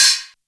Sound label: Hat, Drum & Bass; AMG - Prototype Drum & Bass; Hats & Rides